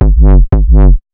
pulses, Loops Electronic

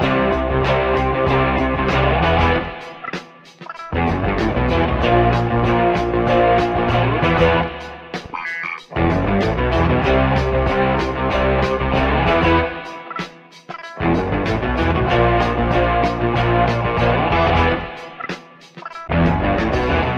guitar, Phonky Loops

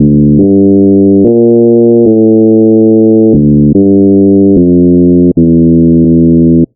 slow motion, Electronic Loops

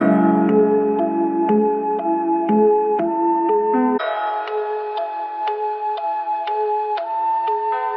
flute landstuhl, Loops, Jazzmatazz

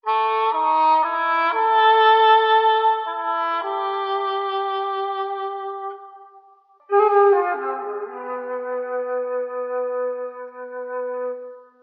sax to flute, Sad; Loops

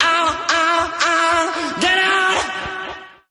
ow ow ow gah SUNG, Voice

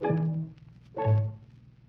two plunks, Stabs

